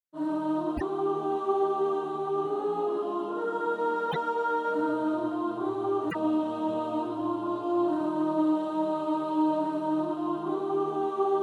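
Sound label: soprano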